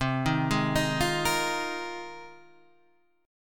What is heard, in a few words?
F Minor 11th, C